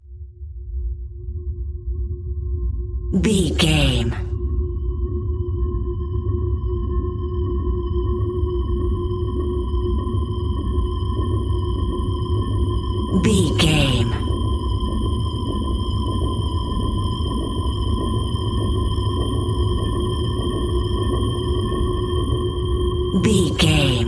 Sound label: portentous